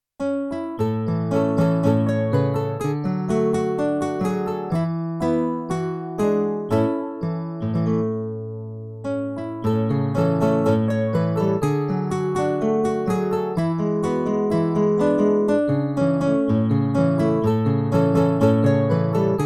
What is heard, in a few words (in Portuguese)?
playback hino